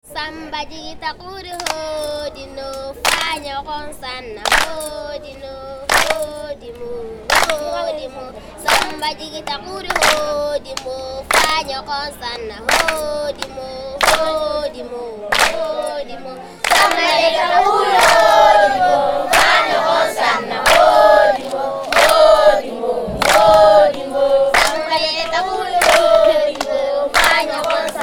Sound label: samba